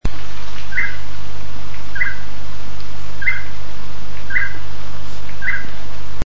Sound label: groove billed toucanet